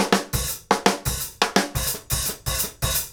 KICK SNARE, Live Snares

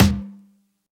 Percussions

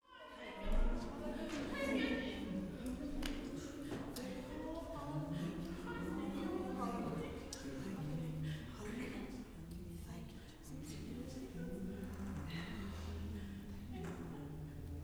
WORSHIP, WORSHIPAMB, Vocal Planet, Gospel

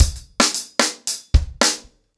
110BPM, ACOUSTIC DRUMS

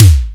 EKICK, ELEC.DRUMS; Crypton - DrumBase5000

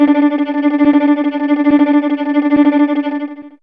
Vln Trem D4, Fairlight CMI IIe; TremStr

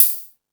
More Cymbals, E-MU Pro-Cussion

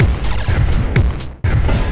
Processed Beat, Drums & Percussion

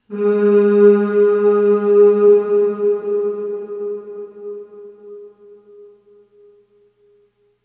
Ooh Short G#2 Long Reverb; Harmonic Building Blocks

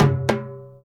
India, Heart of Asia; Spectrasonics - Heart of Asia CD2

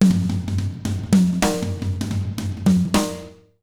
BEAT, Jazz Latin Drumloops

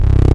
Basses Root C

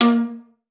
A#3, VIOLA PIZZ; Xsample VOL-5

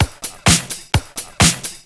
Straight Loop 128 BPM, House

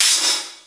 VERB HH, Drums; Distored Reality 1&2

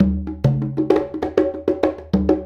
Conga Fill; Essential Percussion

